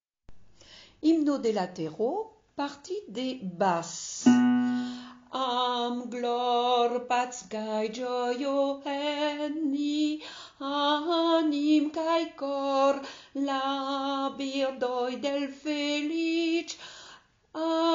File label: Basse